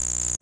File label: electro perc; Precise Electro Percussion; style kits